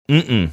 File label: "mmmmmm"